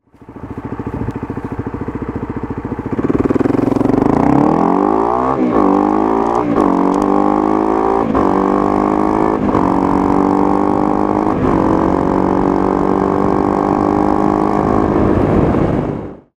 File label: ninja250sl lci n pro baf kasoku